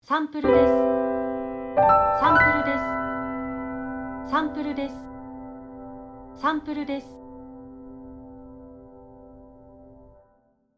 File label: Logo Piano